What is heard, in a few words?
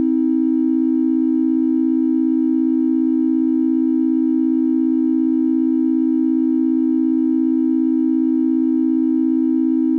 seven equal I